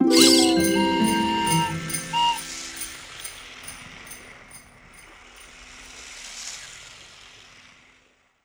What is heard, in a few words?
Podium Holiday